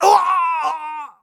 scav death, voice; Escape from Tarkov; scav4

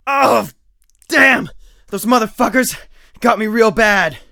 hurt heavy; Escape from Tarkov; usec2

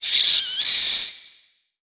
bat; World of Tanks Blitz; hangar halloween 2019

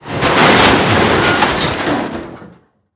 metal construction; World of Tanks Blitz

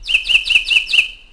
birds karelia; World of Tanks Blitz

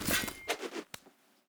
sword deploy; Rust

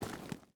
footstep boots gravel walk; Rust

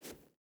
footstep barefoot sand jump start; Rust

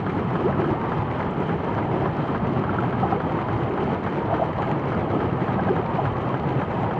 submarines engines; World Of Warships

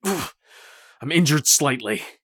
hurt light; Escape from Tarkov

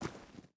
footstep boots gravel walk; Rust

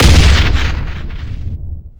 grenade launcher explosion

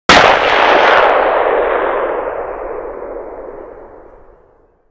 Inf Weapons; Red Orchestra 41-45

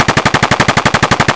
vityaz outdoor far loop; Escape from Tarkov

